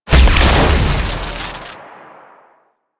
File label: Arachnoid Camper Leg Electrical Burst; Warframe; Corpus